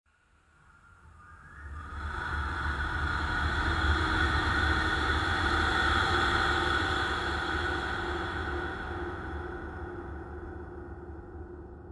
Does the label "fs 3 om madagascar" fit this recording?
No